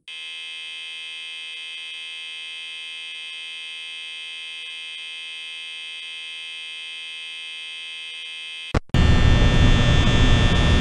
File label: door lights buzzing turnon; five nights at freddy s